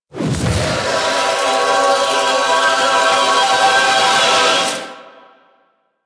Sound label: Death Rise; Warframe; Kuva Larvling